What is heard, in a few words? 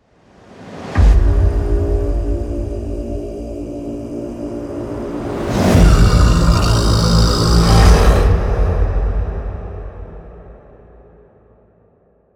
Intro Chant End; Warframe; Entrati Labs; Cinematics